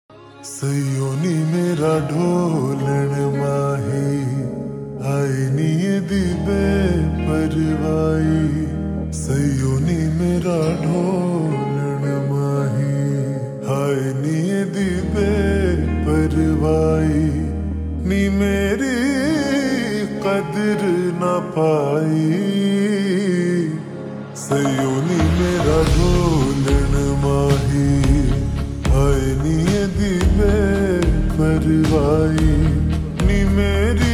Ringtone Slowed Reverb

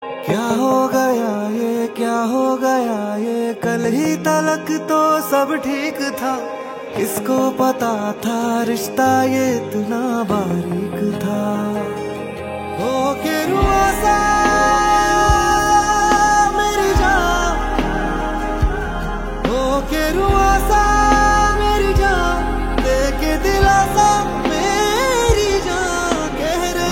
Ringtone

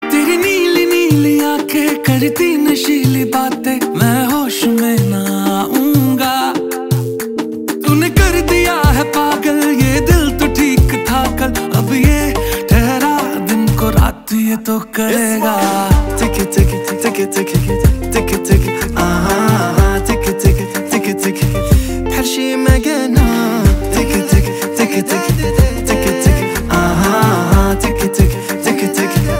Ringtone

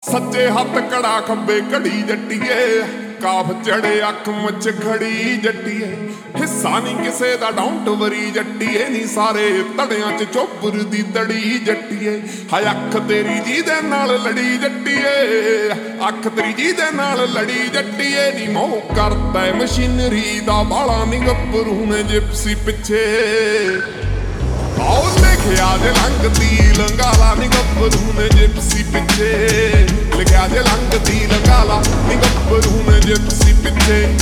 Ringtone Slowed Reverb

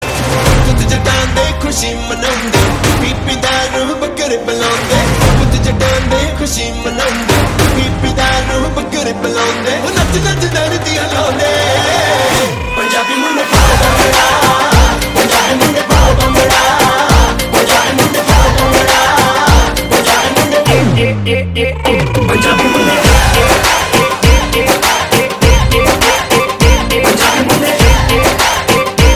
Ringtone

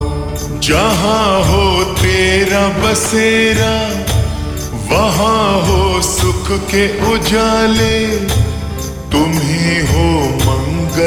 Ringtone Slowed Reverb

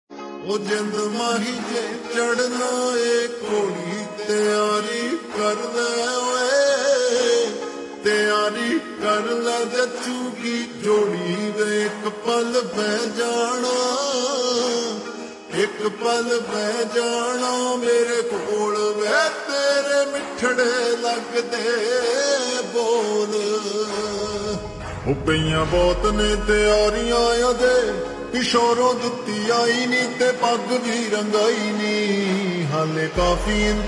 Slowed Reverb Ringtone

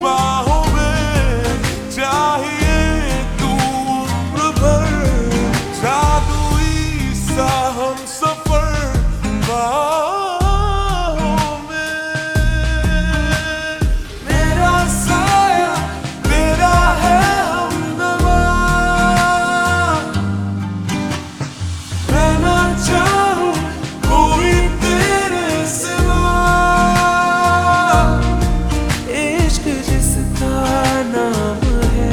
Ringtone Slowed Reverb

